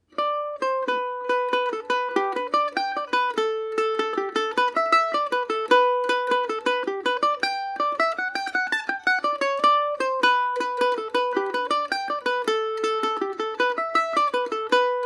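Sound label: G major, Irish mandolin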